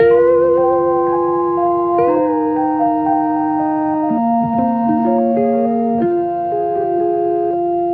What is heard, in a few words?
guitar and keys, Sad